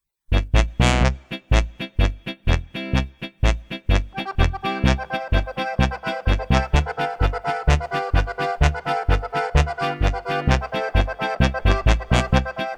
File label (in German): Doppelbass Git1 Bar1 Kontra2